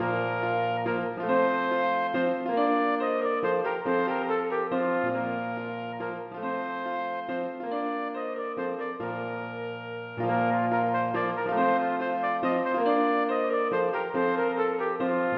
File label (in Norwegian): Menuet for trompet og piano; Aamodt